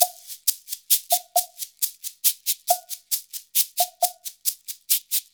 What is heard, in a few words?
90 MIX, Percussion Loops